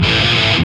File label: GTR; Roland - LA Composer vol. 1